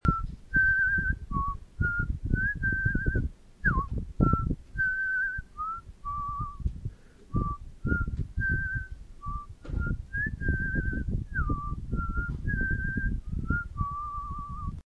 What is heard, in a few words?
whistling while i work